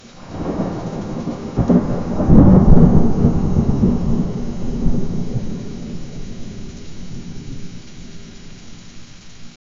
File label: thunder low droning music, thunderstorm